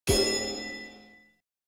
ui room traininggrounds gong

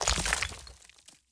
environment block upgrade stone

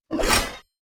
butcher strike

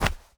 environment object drop textile

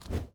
mob release

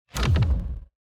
environment successful build low

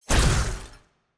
Orbital Spikes Gravygun Reaction; TANK; 3Souls

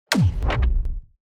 building destruction low

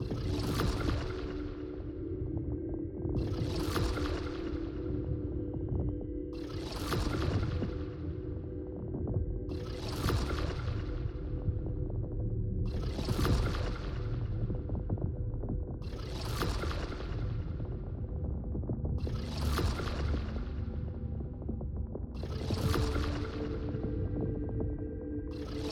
Illusive Wall; TANK; 3Souls

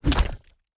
ui construction plan place

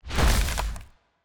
Chest Gravygun Catch; TANK; 3Souls

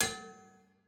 ambience room polygon hammer training

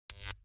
zone electricity zap

